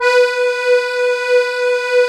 STEIRI B 0, STEIRISCHE

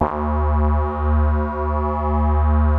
WASP; Masterbits; Vintage Voltage; SEQ-FX

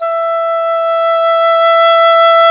English Horn, Orchestral Winds; Roland LCDP04 Orchestral Winds; Eng Horn Swl

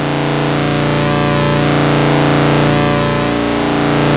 2600 G1, ARP 2600; Hollywood Edge - Giorgio Moroder Rare Synthesizer Collection

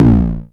KLONE XMOD, Dual Percussion Synthesiser

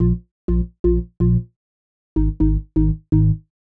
Organ Bass (125BPM), retro house

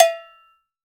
Cowbell Low, Roland BK-5 Percussion

